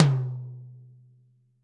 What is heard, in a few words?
TT, Toms; Bob Clearmountain Drums I